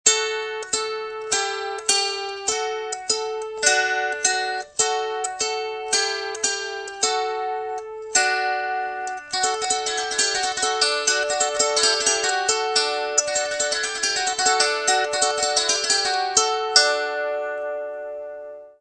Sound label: kantele; musical instruments